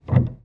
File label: piano